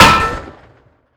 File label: Projectile Sounds; Red Orchestra 41-45